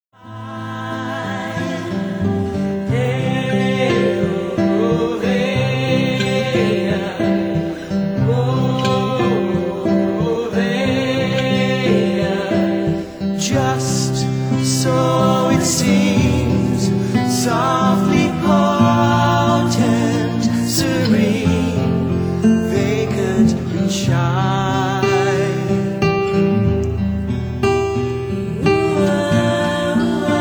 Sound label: Alien Acoustic Session